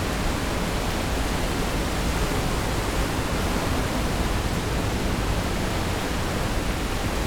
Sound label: magnetic waterfall loop